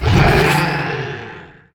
Roar

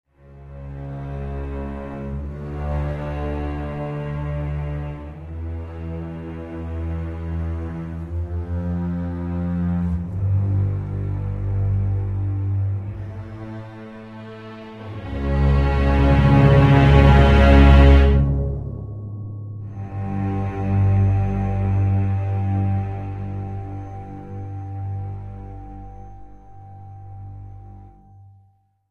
Tense

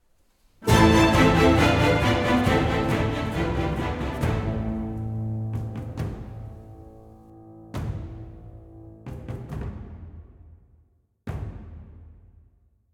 Music Battle

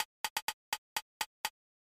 Tile Match Rhythm; Shanghai 2 Dragon's Eye; snes

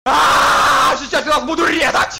SFX Immune; escape from russia mobilization

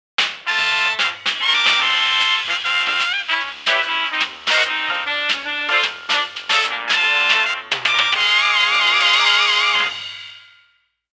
Radio Jingle (Evening)

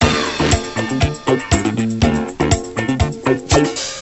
Music; Imagine Interior Designer; 2008 NDS gamerip

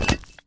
Shield Collect; Shield Caveman Collect

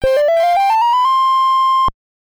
Peace Treaty Signed; genghis khan nes

